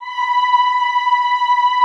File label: Classical Choir, B4; Best Service; Extended Classical Choir